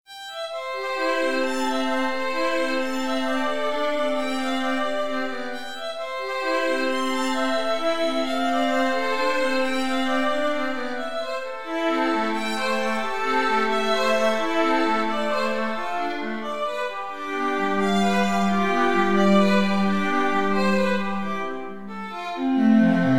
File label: strings hoerbeispiel